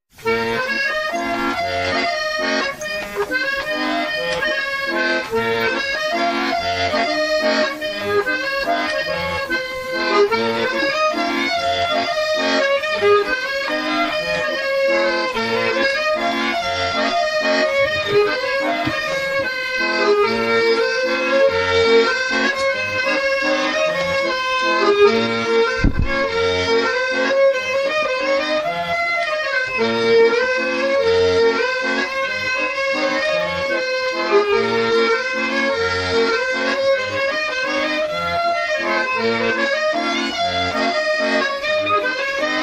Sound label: two breton waltzes